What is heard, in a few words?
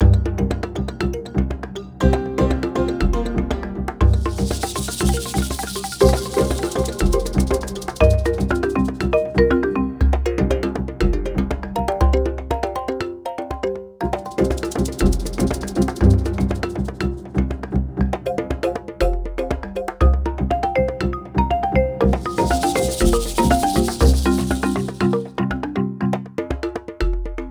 music ruins, bpm120; Donkey Kong Country Returns HD